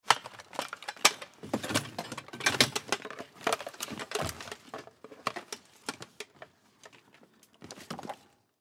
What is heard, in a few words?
Fire Truck Load, Fire Truck Load Oxygen Tank; Emergency; Emergency Vehicles